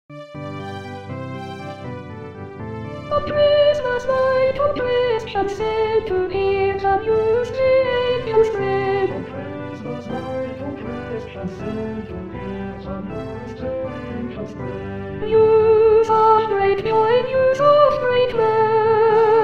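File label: Sussex, Soprano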